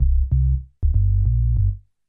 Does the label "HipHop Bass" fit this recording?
Yes